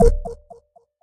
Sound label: you lose; kollision; games